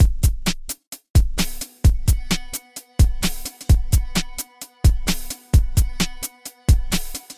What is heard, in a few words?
Nu Skool BreakBeat